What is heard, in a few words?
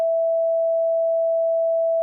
sine e2